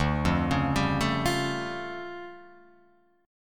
D Minor Major 11th down Guitar Open F; DmM11 arpeggiated; JGuitar

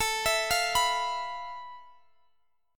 F Major 7th Flat 5th A, guitar chord

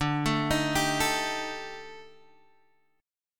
Eb Major 7th Flat 5th D, guitar

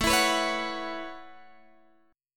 A 6th Add 9th, Guitar, Open G

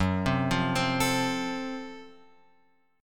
F sharp Minor Major 7th Flat 5th F sharp; F#mM7b5 Chord {2 3 3 5 X 5} arpeggiated

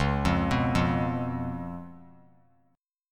C sharp 7th C sharp; arpeggiated; C#7; JGuitar

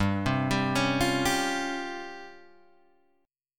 Db Major 7th Flat 5th G, chord

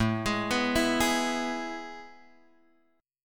A Major 7th Suspended 2nd, chord; AM7sus2 Chord {5 X 6 4 5 4} arpeggiated

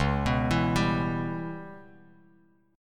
C sharp Minor 7th Flat 5th C sharp Bass Standard; C#m7b5 arpeggiated; 9,14,14,12; JGuitar

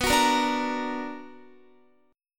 B Minor Major 7th, Guitar; strummed; 4E,4A,5D,5G,5C,6F

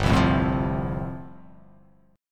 G 7th Suspended 2nd Suspended 4th G

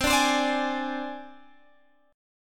C Suspended 2nd Sharp 5th C down Guitar Open G

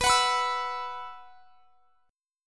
B Suspended 4th; Bsus4; strummed; x,x,21,23,24,24

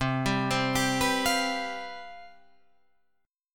C Major 7th Suspended 4th, down, Guitar, Drop D

